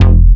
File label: Bassline F Bass